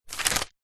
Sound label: foley notepad page turn thin paper a4 push forceful finger